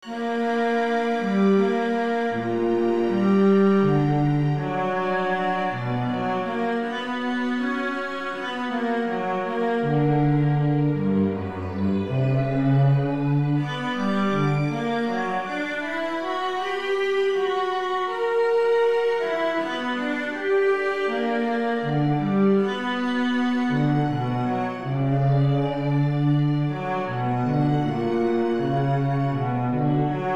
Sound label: Cello Concerto; II. Andante